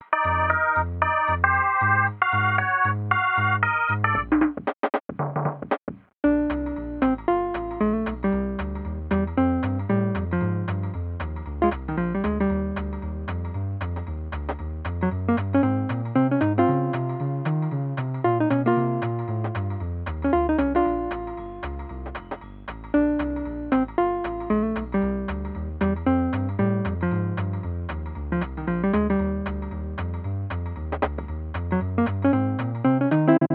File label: Jazz (Aircheck, Phono)